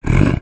ceiling crawler die; edgar; enemy

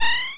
acorn squeak; project hawk

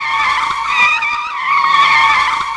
tire squeal; stunt rally; surface

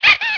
ducky squeak; project hawk

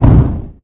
explosion tiny; endless sky

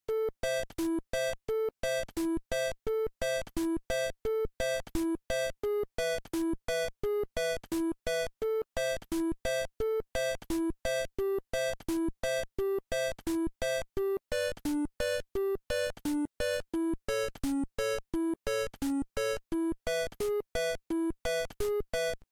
forest, music; project hawk

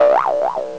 bounce; edgar; boss; blob boss

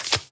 draw card from desk

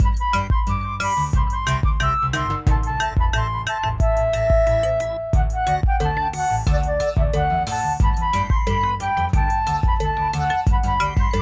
beach, music; commander genius

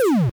throw; project hawk

